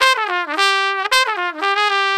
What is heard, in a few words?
110 NuJazz Trumpet _Dmaj; Brass; Pro Tools Essential Loops; Digidesign